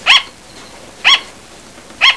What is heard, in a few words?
barking; Barking is simply a loud repeated "bark" that is believed to be used to find others or to warn others